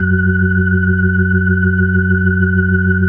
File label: F#2F, B3, Vintage Keyboards